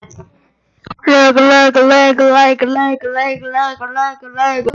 laugh

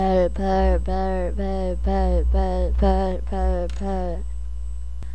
bell

